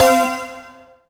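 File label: x button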